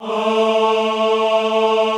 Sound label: AHH A2, Classical Choir; Best Service; Extended Classical Choir